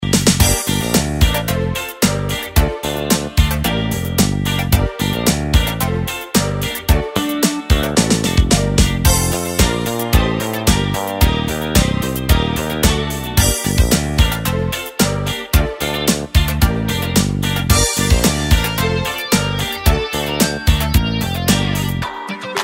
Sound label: Fretless Pop